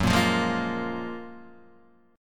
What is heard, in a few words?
Gb Major 9th, down, Guitar, DADGAD